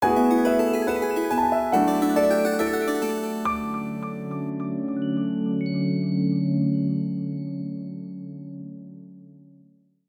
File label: Victory!; Ikenfell Original Game Soundtrack 2020